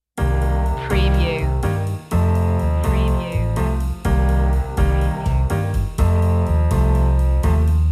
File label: daytime stroll loop intro